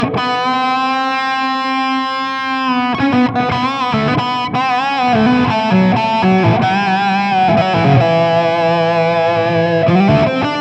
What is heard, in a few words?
ROYER 101; studio